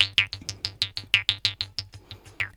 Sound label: KHOMUS